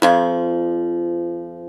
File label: SAZ F3, Plucks; Eth Plucks, Pluck Duo